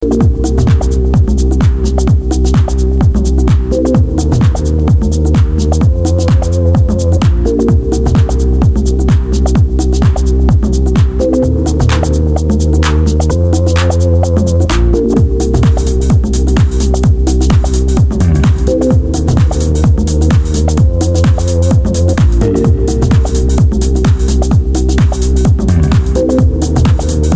discomusic; chaoscity

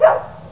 dogs

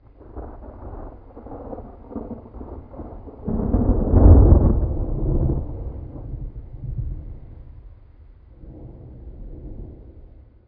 far thunder

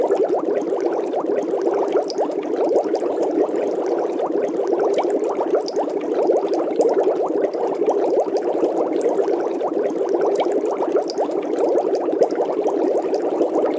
fishtank

